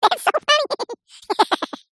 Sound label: cartoon laugh high pitched says thats so funny